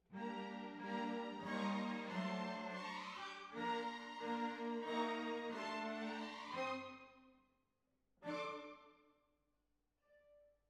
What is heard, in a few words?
Cbasses